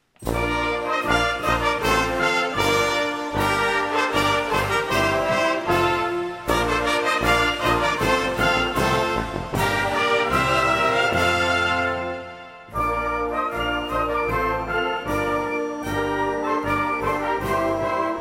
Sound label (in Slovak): tanec